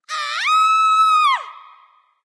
battlecry; smite; jingwei

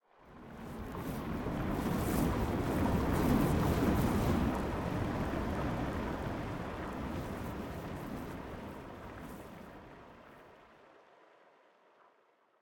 victory surf; smite; pele

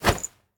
mvt punch; smite; gilgamesh

